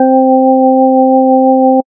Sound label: percussive organ